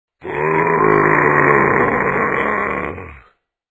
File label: GROWWW; Haloween; Rosegarden